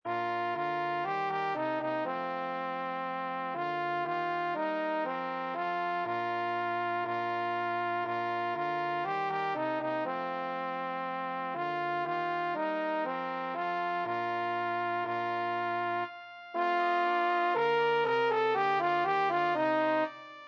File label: ding dong mid; Ding Dong Merrily On High 2010 ALTO PART